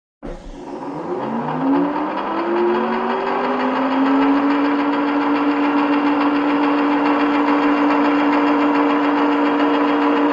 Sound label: Gas Powered Generator; Large, Industr; Large, Industrial Warehouse, Gas-powered Generator; Continuous